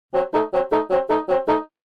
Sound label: Old vintage cartoon accent